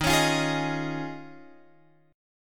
D sharp 7th Suspended 2nd Suspended 4th, Guitar, Open F; D#7sus2sus4; strummed